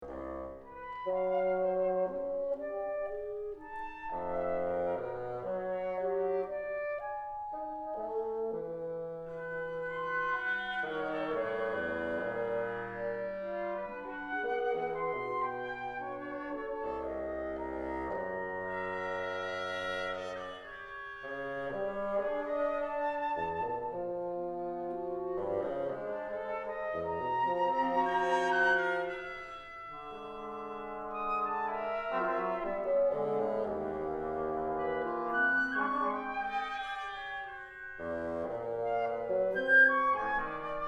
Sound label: octet